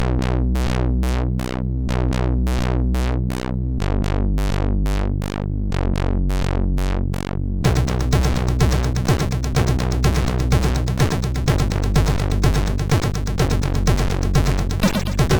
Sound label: Megastyle tune, MOS6581R4; Sudden Death